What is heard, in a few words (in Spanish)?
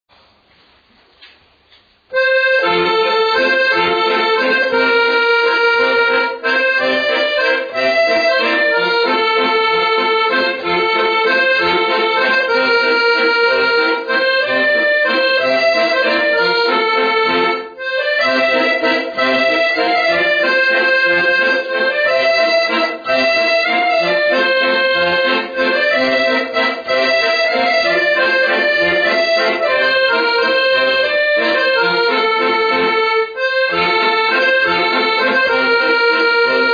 adios con el corazon acordeon; Pasodoble español